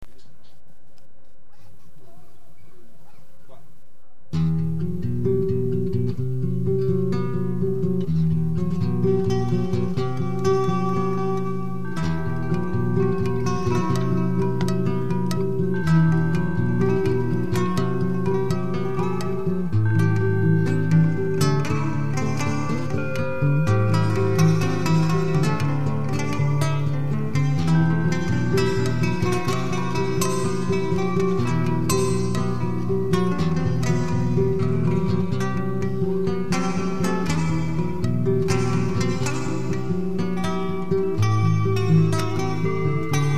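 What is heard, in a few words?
instrumental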